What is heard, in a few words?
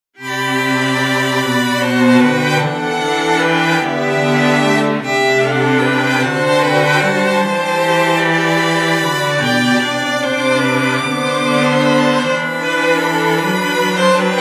Pops